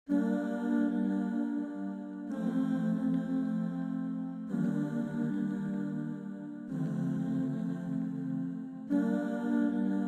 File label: 102 BPM G Min Choir, Afrobeats; Melody; Loop Stems; Cymatics; Know You